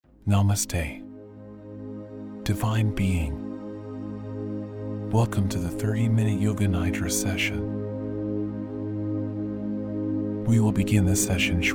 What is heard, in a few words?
528hz Shamanic Healing Masculine